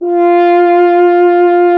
F. Horn; Miroslav Vitous; Solo Instruments VOL-2

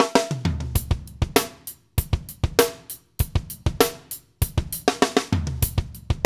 DRY; AMG - Now CD-ROM (Roland); Keith Le Blanc

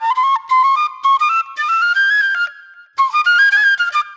Disco Flute; Sonic Foundry (Sony Creative Software); Manhattan Flutes; Session 04 - Cruisin' Disco

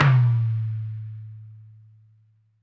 LOFI, LO-FI KITS; Spectrasonics; Burning Grooves CD2

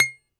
BALALAIKA, Prima Balalaika, Plucked Strings

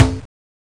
Conga, Genesis; M8

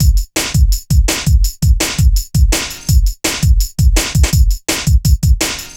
Metallic Rolla, Drum Loops 166.5; Zero-G Jungle Warfare 1, 2 & 3

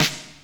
SNR, DRUM ZONE; Masterbits - Total Zone

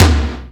power tom; Greytsounds; Scott Peers Sound Engineering

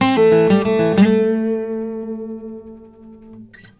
BARI LICK, Blues Guitar; ILIO; Fretworks

